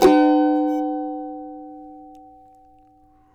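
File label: C# minor, Guitars; Spectrasonics; Hans Zimmer Guitars VOL-2; CAVA CHORDS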